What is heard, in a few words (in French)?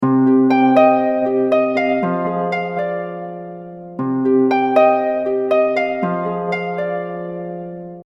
harpe quiz